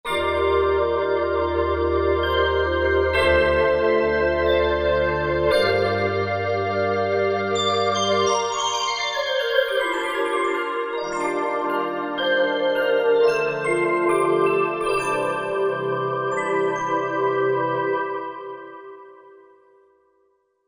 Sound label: Magic Organ, Korg MSC-9S Organ